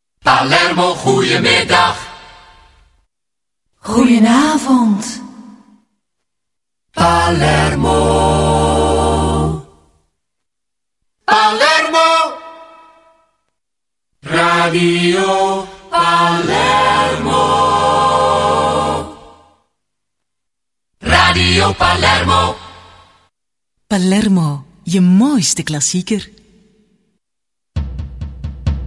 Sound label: jingles; Palermo